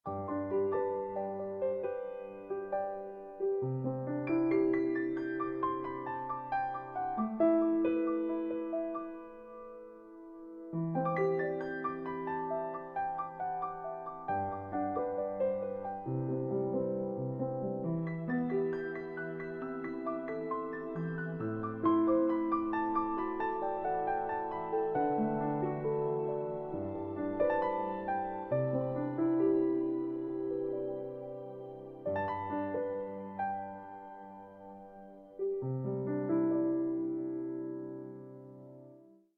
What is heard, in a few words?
Meditation, alarms; Tecno; Spark Go 2023